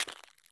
walk asphalt; Escape from Tarkov

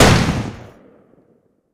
Weapons Browning End; DCS

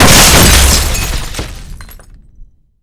television shot, explosions

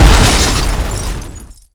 car impact

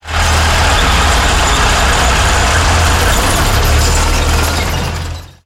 turn rattle, tanks; engines; War Thunder

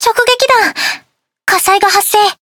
Fire Alarm; alarms; Iona; World Of Warships; Arpeggio

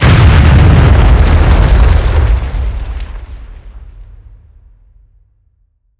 Explosions Explode Ground; DCS

